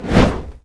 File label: skill fire win; SkillSound